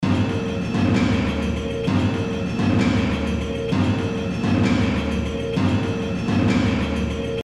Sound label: kettles 130